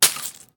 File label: Shell 12ga leaves; STALKER; IWP